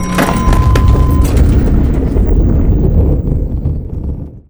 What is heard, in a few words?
Elevator Stinger; SCU Offices